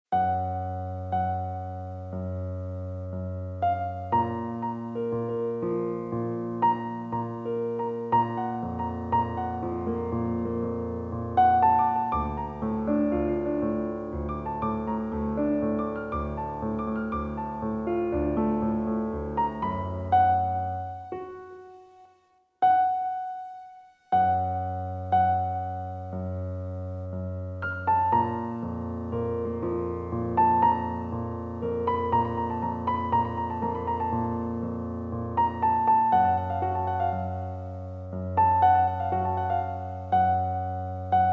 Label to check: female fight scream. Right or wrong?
Wrong